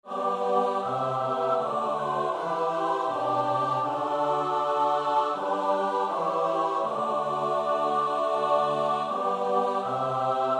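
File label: soprano alto tenor bass; Bach (Johann Sebastiaan); BWV 059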